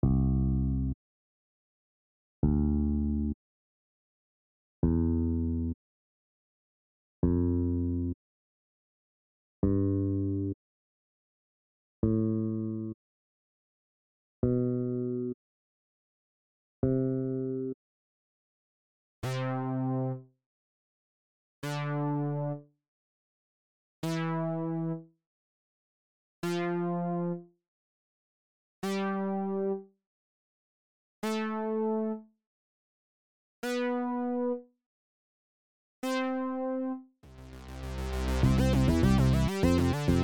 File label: Scale